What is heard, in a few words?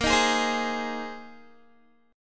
A sharp 7th Suspended 2nd Suspended 4th, Guitar; A#7sus2sus4; strummed